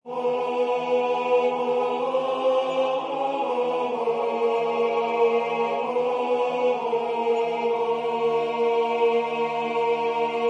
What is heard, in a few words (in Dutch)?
tenor, koor; Wandrers Nachtlied